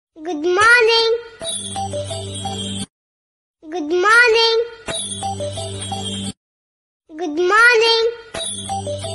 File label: instrumental bgm